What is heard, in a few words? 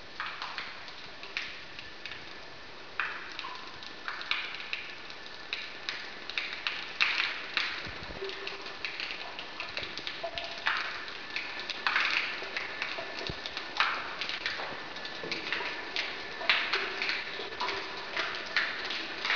water splatter slow